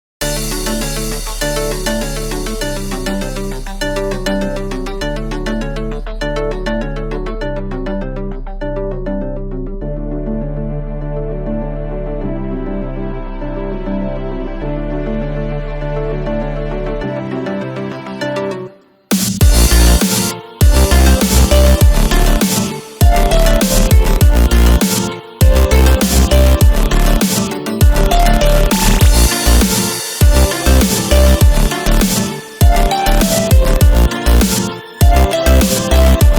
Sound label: Instrumental, Pony Music